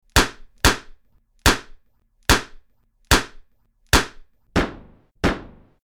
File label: shots